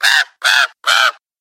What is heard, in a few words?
monster raven